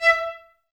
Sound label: VLS E 5, String Ensembles, Violas, VLS Detache; Miroslav Vitous